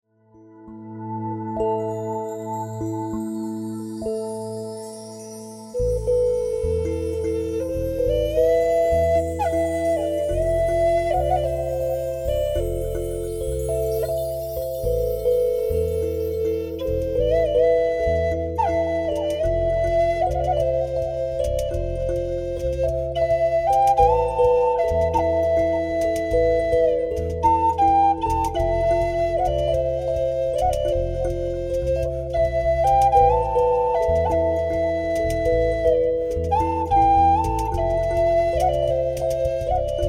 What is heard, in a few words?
sansula